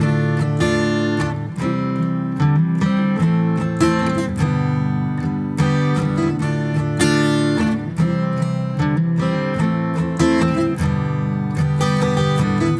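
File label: 150 guitar acoustic D minor; magnesium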